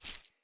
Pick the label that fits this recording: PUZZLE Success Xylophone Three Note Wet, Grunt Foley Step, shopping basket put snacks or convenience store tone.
Grunt Foley Step